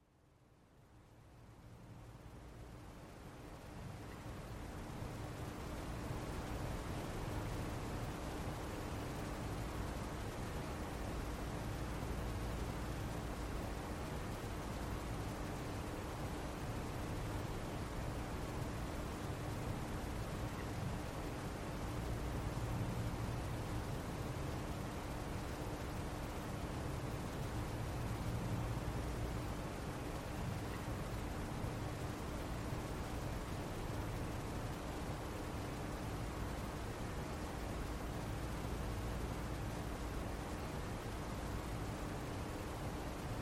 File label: calming piano music with white noise